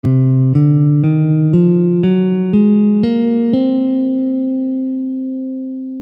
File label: Locrian♮2 C, Gammes & Modes · Guitar